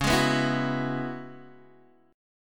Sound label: Db Minor 11th Db, Guitar DADGAD; Dbm11 strummed; x,4,6,4,7,4; JGuitar